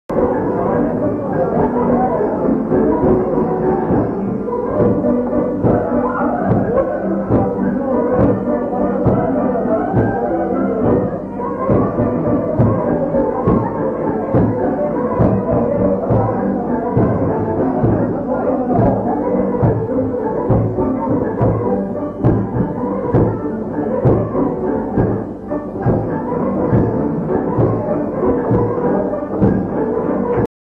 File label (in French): piano meca